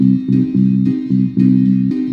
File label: bass, Loops